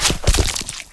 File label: hit